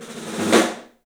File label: STEEL ROLLS; 3D Drums